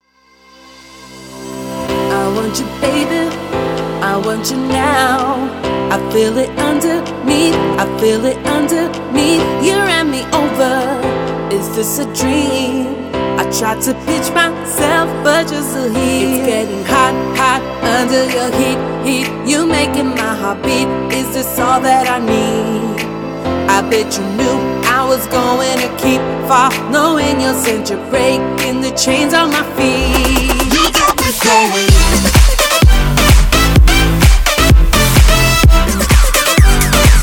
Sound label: Tryout Jazz Mix; schoolcheer